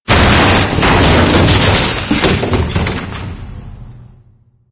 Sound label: timed debris fall; Left 4 Dead 2; Source Engine